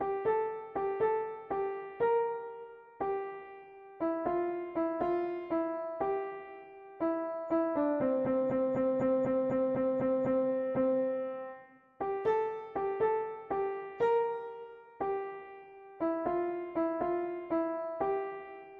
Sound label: rhythm and syncopation